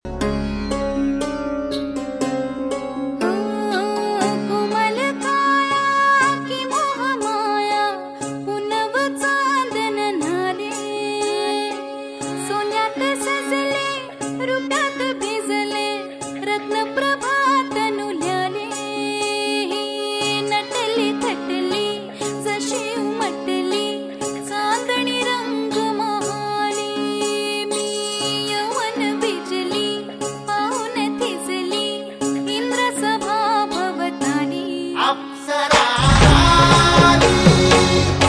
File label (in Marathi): Marathi Ringtone; Natrang